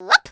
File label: BEAVER JUMP